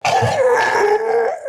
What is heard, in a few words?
Male Death; Zomboid 41.78.16; Project Zomboid